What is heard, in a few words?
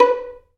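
Vio pizzicato B3; Digital Sound Factory Virtuoso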